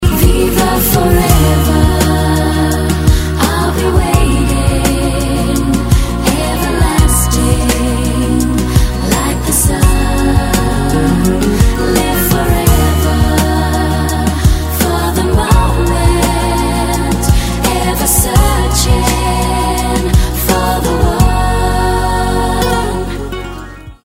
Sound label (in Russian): muzyka 80kh 90kh